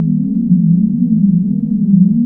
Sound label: Korg, FX